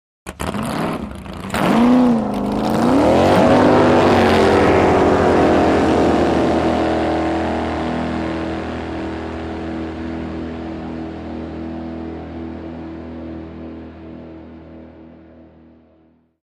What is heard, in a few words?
Drag Boat Start revs